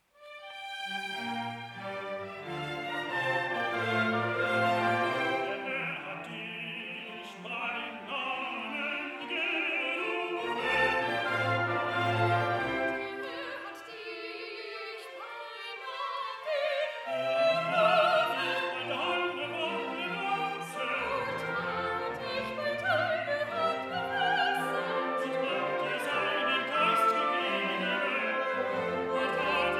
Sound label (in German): Duett Sopran Tenor; III Petri Bekenntnis